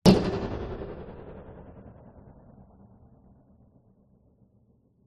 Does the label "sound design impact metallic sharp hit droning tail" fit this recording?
Yes